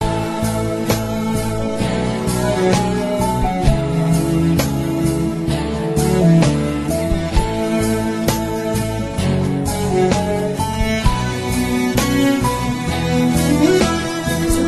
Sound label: Chorus Violin